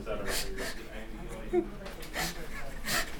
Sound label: laff